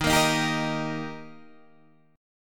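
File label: E flat Major, Guitar, Half Step Down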